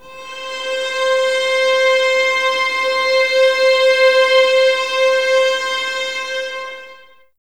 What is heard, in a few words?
VS C 5, String Ensembles, 11 Violins, Sul Ponte; Miroslav Vitous